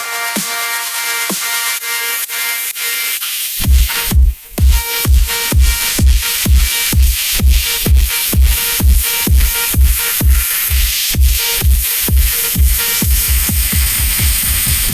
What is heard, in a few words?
electronic dance music